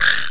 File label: beatle; Undercroft; enemy